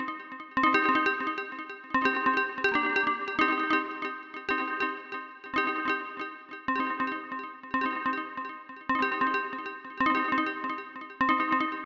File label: Frog B Proximity; Proteus